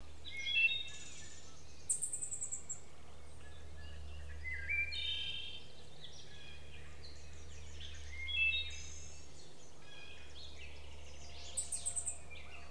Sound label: woodthrush